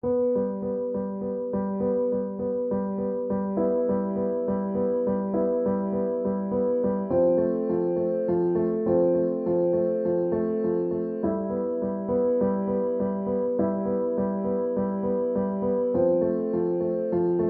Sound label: piano impro; kahvi collective